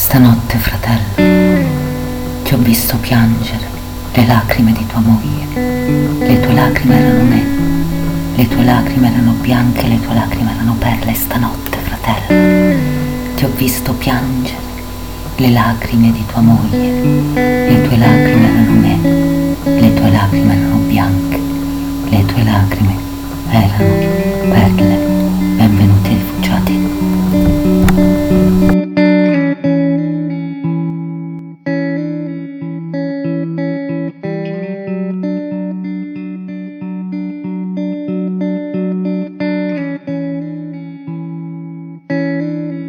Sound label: blues musica; nigeria